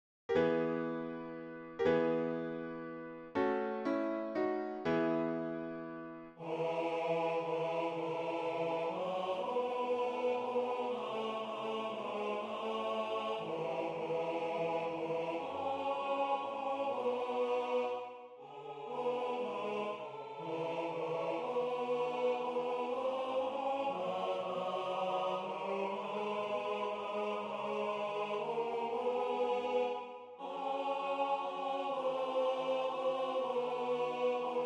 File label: tenor; Waiheke Island Choral Society